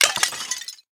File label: pot destructable